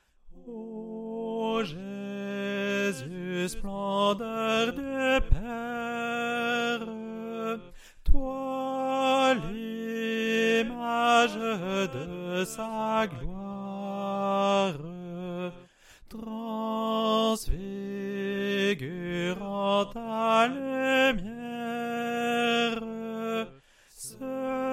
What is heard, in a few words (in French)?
ensemble S; Soprane